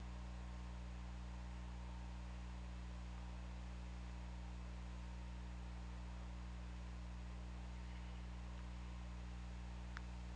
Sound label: Orca; OrcaLab